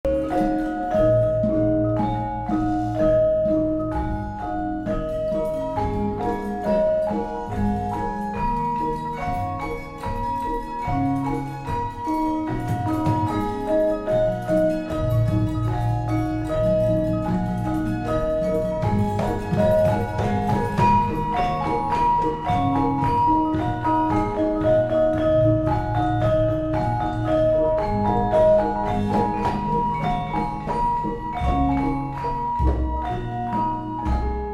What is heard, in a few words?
Javanese Gamelan, Pathet Slendro Nem; Indonesian